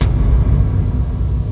thump; Quake; PainKeep

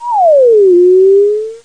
zoom; InventorLabs Technology

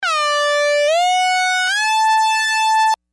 Moog Lead; cooledit

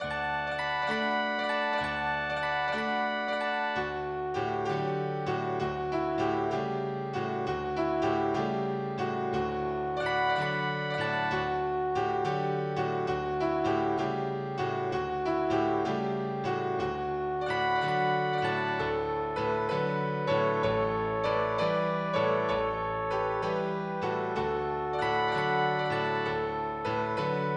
barcarol

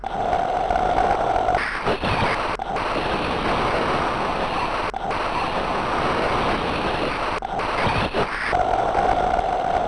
tv sound

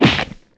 kick

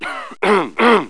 THROAT

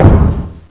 snow pile; Pinball

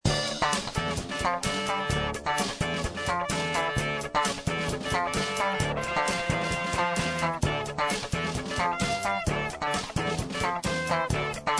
menu music; planearcade

